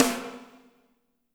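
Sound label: piccolo snare; Spectrasonics; Burning Grooves CD2; PICC. BALLAD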